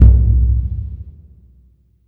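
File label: Burundi drum, Heart of Africa; Spectrasonics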